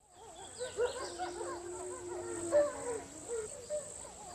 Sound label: wolves; ambience; minetest